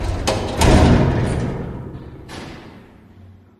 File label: doors; Five Nights at Ronald's